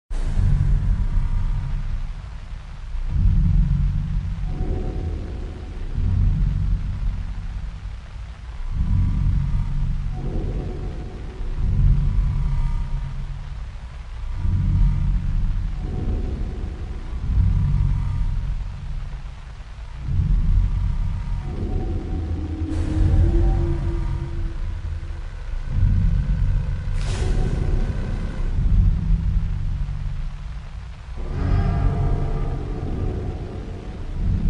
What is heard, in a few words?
Ambient horror track; Turtle Head Unmasked; Windows